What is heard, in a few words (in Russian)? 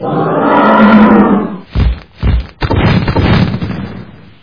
monstr rezkii shumnii